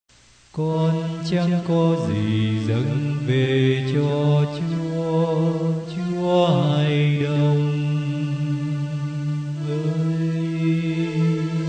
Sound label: bass